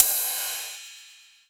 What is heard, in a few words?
M-Audio Venom Ride Cymbal